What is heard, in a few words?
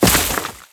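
Crewman Step Vegetation, Footsteps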